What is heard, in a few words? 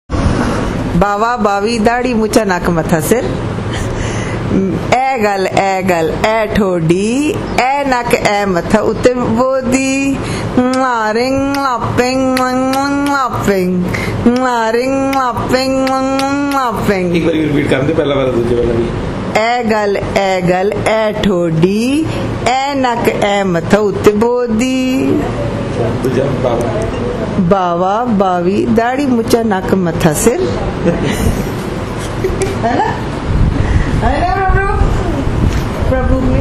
Punjabi